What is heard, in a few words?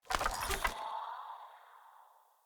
Staff Caster Un Equip; Weapons; Player; Staves; Wazzard Staff Caster